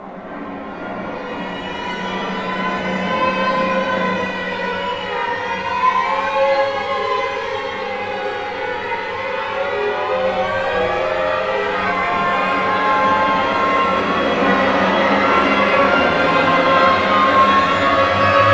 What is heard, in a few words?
STR GLSS, StringFX; Roland - LA Composer vol. 2